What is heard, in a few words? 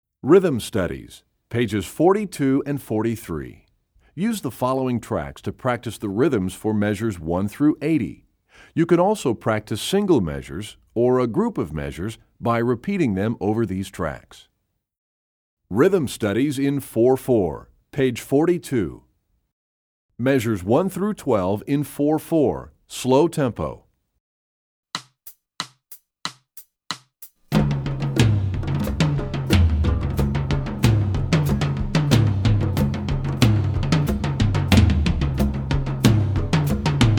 Rhythm Studies; EE2000 Book2